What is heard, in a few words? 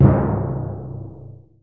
TYMPANI; ORCH.COMP